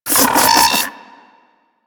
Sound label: Vocal Web Charge; Warframe; Corpus; Arachnoid Wraith